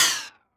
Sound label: Open Hat Hard Bend; Lost Tapes From Mars